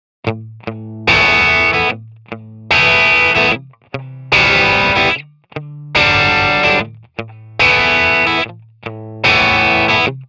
British crunch

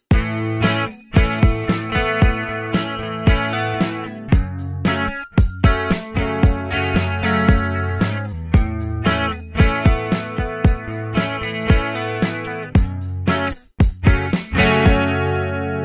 chorus

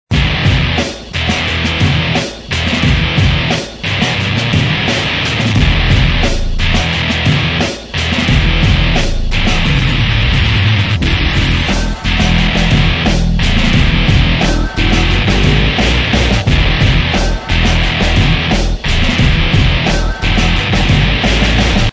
FZ7 Metallic Heavy Riff

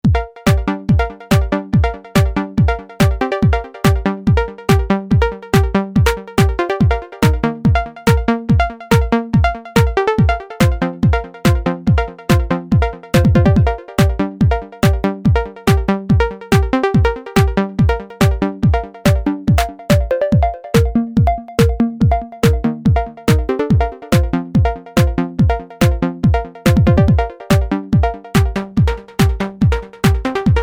Dual Osc; EMX

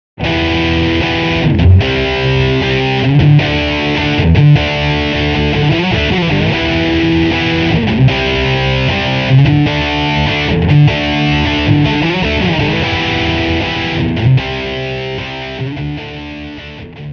ES335

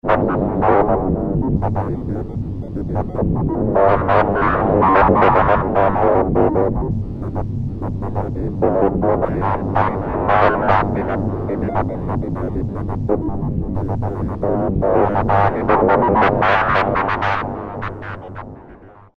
Talk Machine

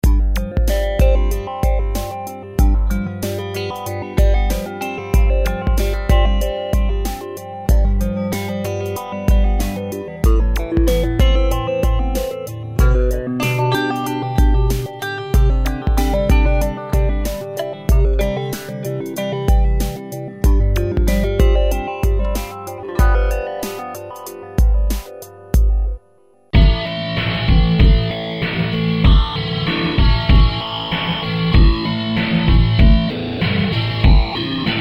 Random flanging

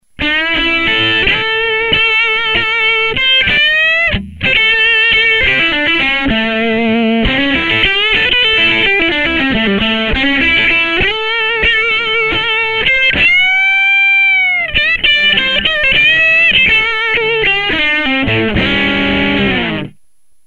Bright; Roger Linn Design

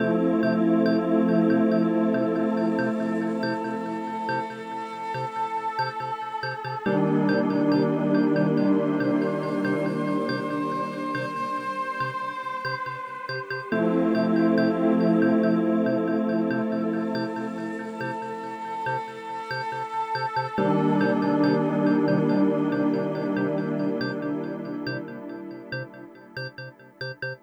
mellow mood

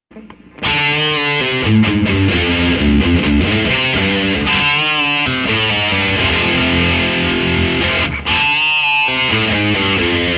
Titan Dirt w Verb

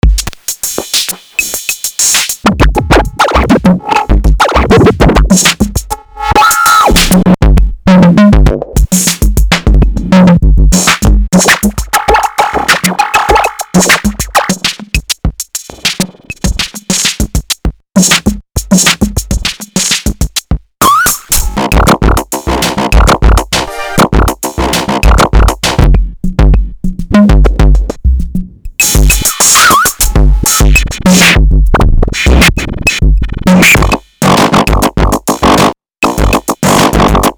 Work Bench 808

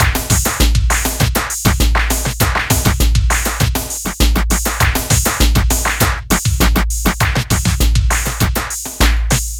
dance pack techno 100

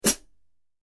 HH, rock hat; ZBT 14

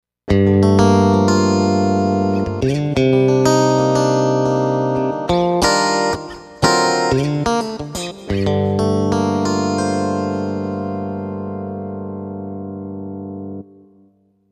Tyros Mega Clean Gtr

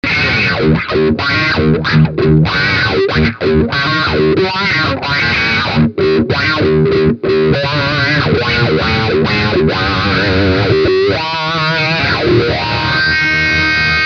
wah

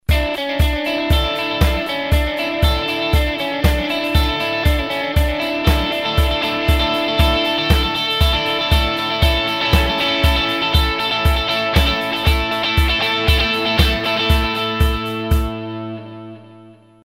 always synched

